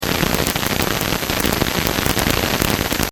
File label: fan side